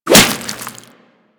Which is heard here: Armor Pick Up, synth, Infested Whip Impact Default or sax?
Infested Whip Impact Default